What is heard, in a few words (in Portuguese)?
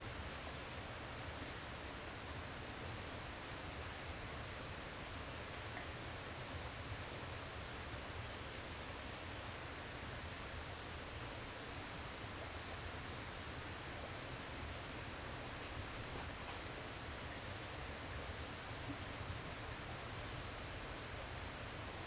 Riacho com passaros e grama; agua